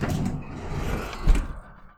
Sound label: Door Open, Oven Door; Cooking; Turkey Cooking Simulator